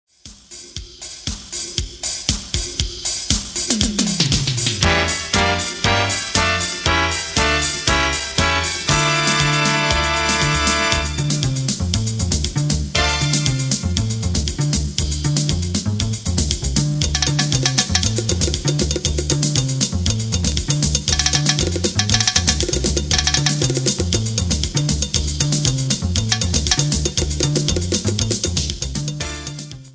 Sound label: Salsa